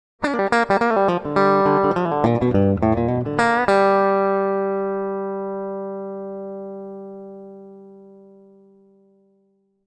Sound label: sample lick